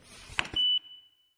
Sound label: keycard used; scp